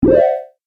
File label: Classic Lo-fi Magic Door Open, video game sound effects